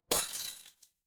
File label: glass shatter